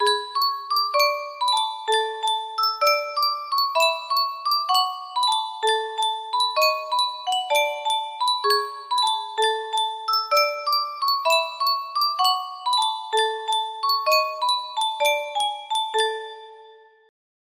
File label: yunsheng music box, melody